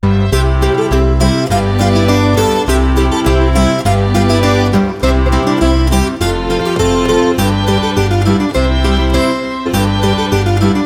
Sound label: Nordic Folk Guitar